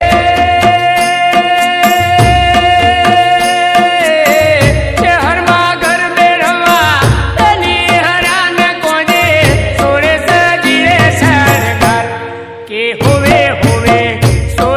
gujarati garba

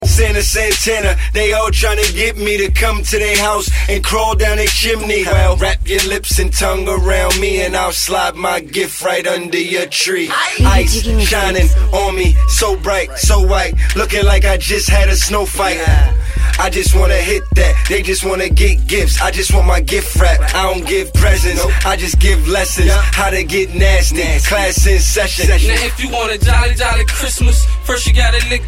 christmas song

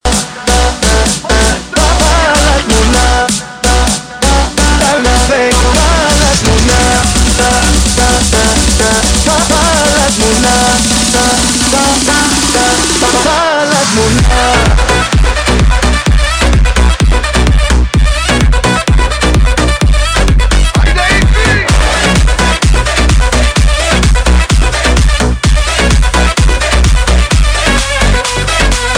Trap Bass Remix

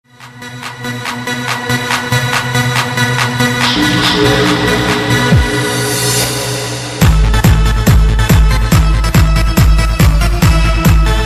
Supersaw, ringtone

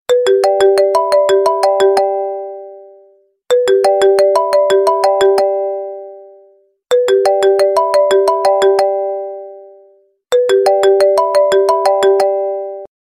apple i phone

